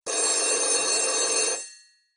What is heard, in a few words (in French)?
vieille sonnerie de telephone